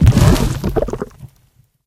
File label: Material Lava Melt General